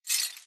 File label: imp bullet lightballistic tile debris; Titanfall 2